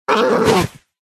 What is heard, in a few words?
hedge death; pet hedgehog